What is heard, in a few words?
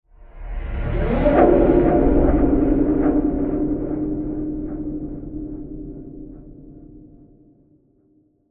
Scary String Transition With Delays; Scary Soundscapes; CraftedSignals